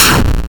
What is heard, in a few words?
Explosion; little robot sound factory